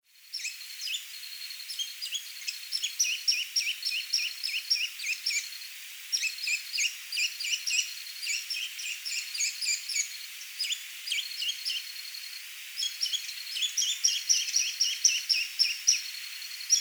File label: Accipiter superciliosus song; Santa Rosa